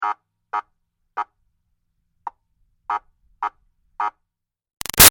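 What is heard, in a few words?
Siren Police Buzzer Short Blips Close Up; Emergency Vehicles; Sound Effects:Vehicles; Big Room Sound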